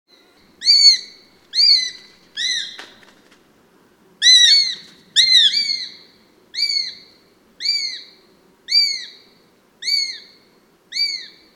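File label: perepelyatnik golos, sparrowhawk voice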